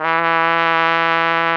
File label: E3, BRASS; AKAI S-Series CD-ROM Sound Library VOL-8